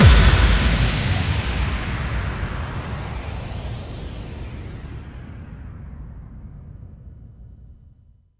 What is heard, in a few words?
bassdrum reverb FX kick; Vengeance Samples